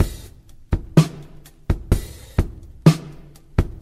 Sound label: funked up, Boombap drum loops